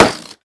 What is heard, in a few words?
pop; World Of Goo